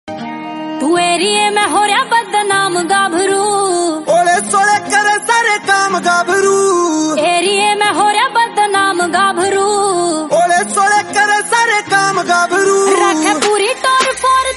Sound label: Haryanvi Song